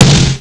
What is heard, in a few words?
snare; robocop